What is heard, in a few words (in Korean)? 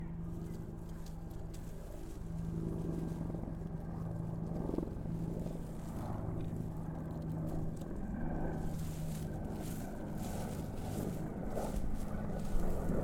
비행기